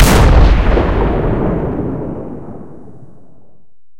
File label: cannon 120mm Rh120 shot, cannons tanks; War Thunder